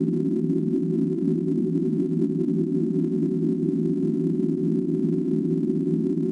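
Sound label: generator loop; naboo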